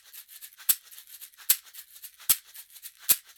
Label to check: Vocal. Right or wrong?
Wrong